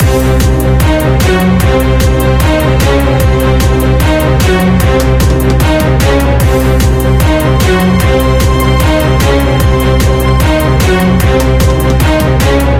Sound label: boss BGM